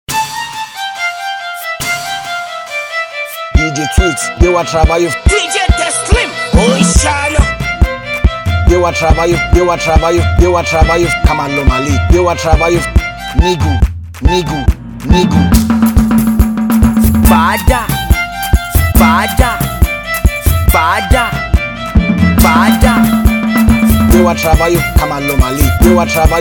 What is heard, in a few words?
Beat